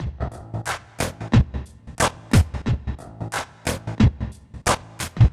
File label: Gated Beat (90BPM); musicradar